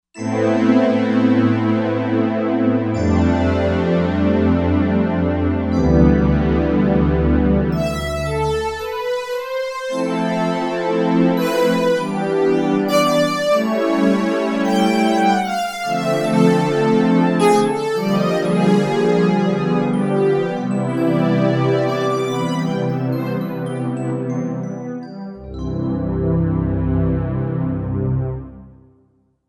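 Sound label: Dyna PWM, synth pad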